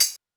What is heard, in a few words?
TR-707 TAMB; Polyend; Stazma & Concrete Collage; Concrete Collage Drum Kit; Concrete-707